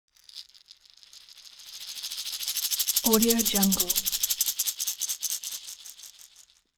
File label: object shaker wood rattle dynamic